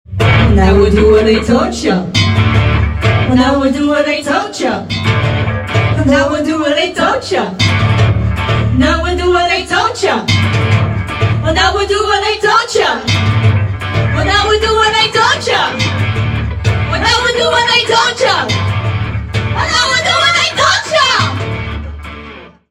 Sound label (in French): Travail Chant